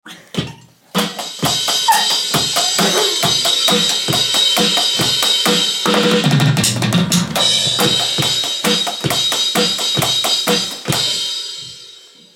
4/4 drum

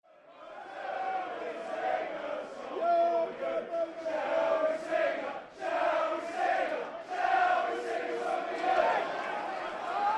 fans singing at Carrow Road; gillingham fc

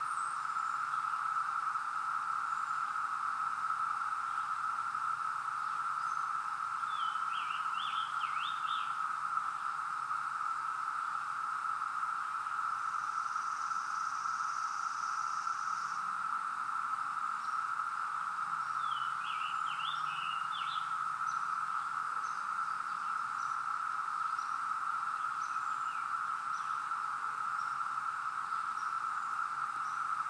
cicada drone

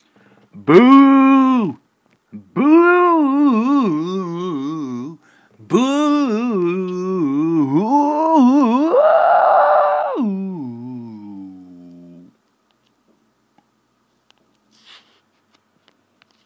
bhooaaaah

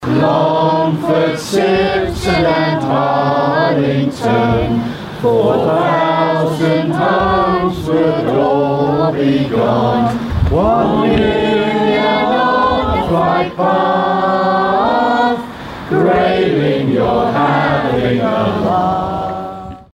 heathrow carol singers

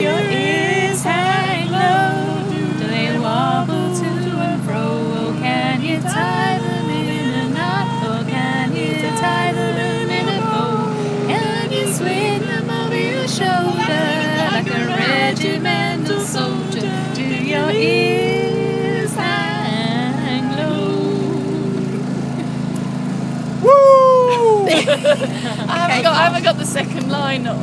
singing on the boat trip

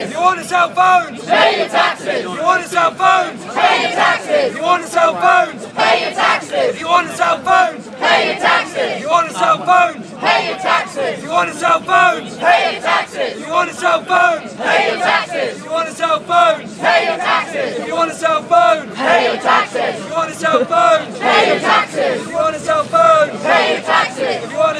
cuts protesters outside vodafone oxford st

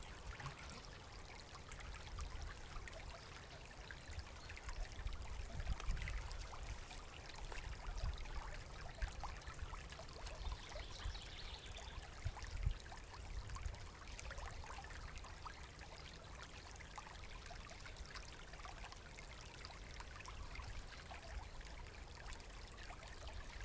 sounds of spring

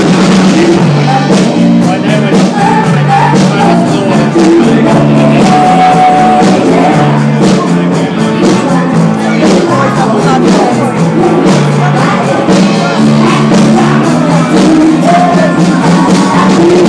country and western partay

